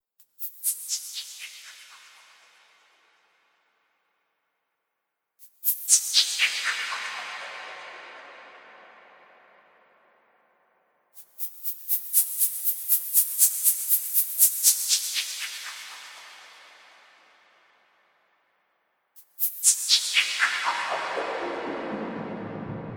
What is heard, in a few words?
Creature Scary Voices Surreal Ethereal; Airy Horror Scifi Science Fiction; Evil Voices